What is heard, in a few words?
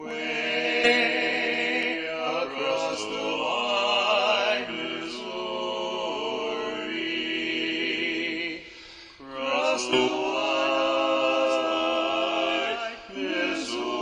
Shenandoah Tag; learning tracks